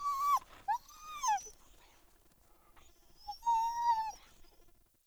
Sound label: Wolf Whine Concerned; Animals; Perception